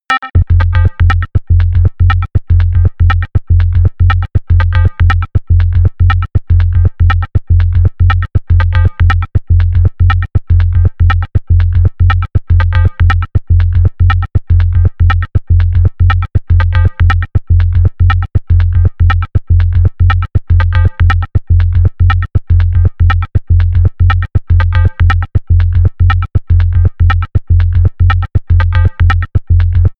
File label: level music